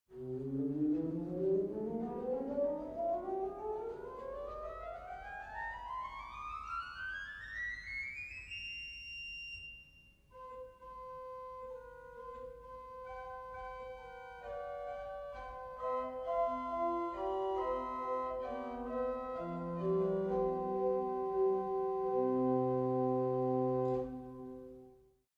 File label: Flo Dolc 4; Schultheis Schlimbach